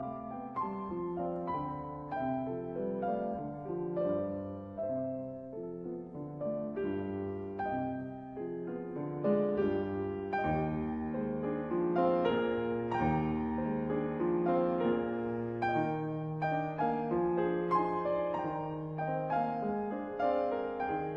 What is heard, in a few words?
Cubanas for piano Guajira; Hernandez; Juana Zayas